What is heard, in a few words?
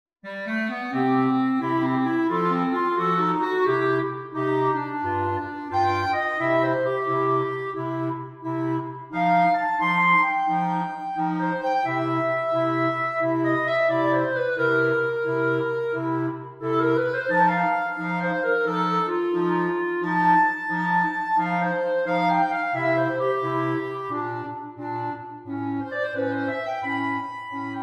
Blues